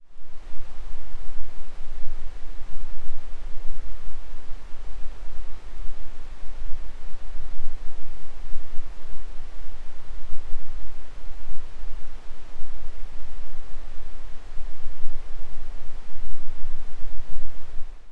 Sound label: 9km ambient noise